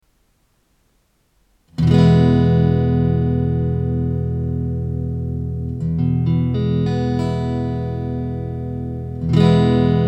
e-dur

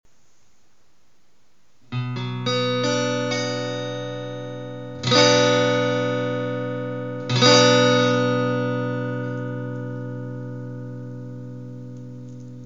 b-moll, guitar

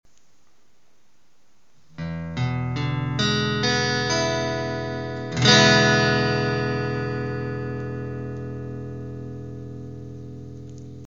e-dur

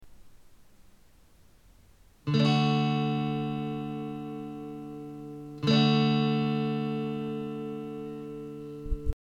d dur, guitar

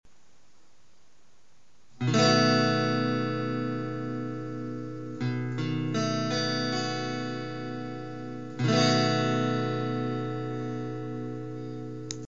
H-Dur7 Akkord Gitarrengriff; H7 Akkord – Hörbeispiel E-Gitarre; Gitarrenakkord

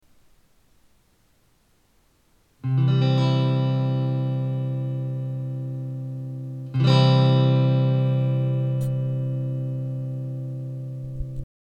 c dur, guitar

